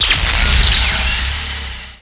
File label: Deth coil